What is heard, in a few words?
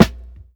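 round snare a key, tuned to the A note; Duration 0:01; Mono; drum single hits one shots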